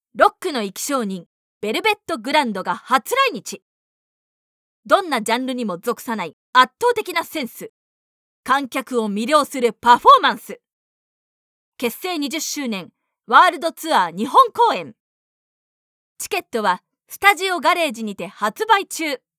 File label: rock